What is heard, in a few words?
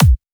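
Hardcore Kick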